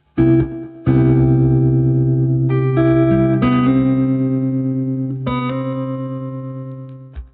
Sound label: Chordy, latin